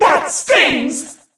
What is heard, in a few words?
mon hurt vo